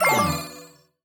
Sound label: neutral mode